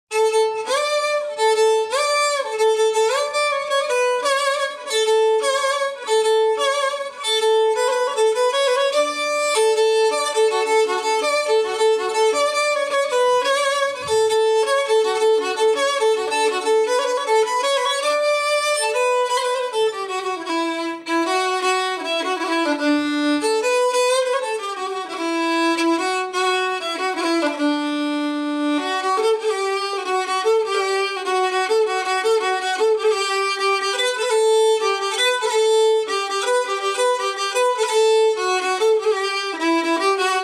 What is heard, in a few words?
Violin; Tavalod